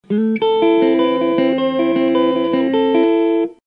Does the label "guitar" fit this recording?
Yes